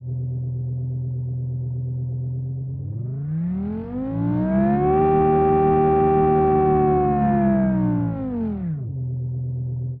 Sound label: v10 german ext, engine sound